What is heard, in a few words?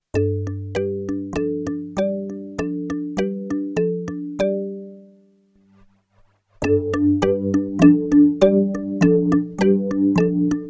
DX7 Marimba; June60